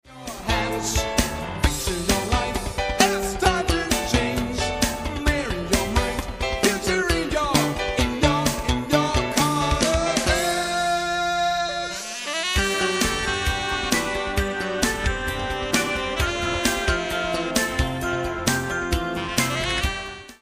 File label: bassline